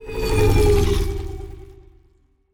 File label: ant ship colony move; biogenesis